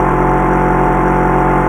KART Engine loop

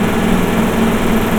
KART Engine loop

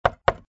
knock

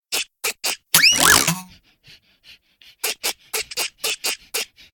Backflip

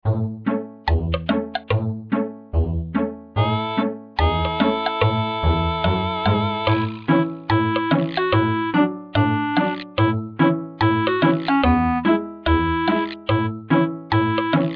Farm Race Slow

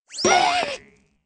Surprise

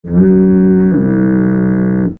Foghorn

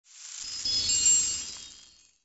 single pixie dust

